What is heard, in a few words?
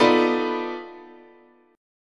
B 7th Sharp 9th Flat 5th, chord, guitar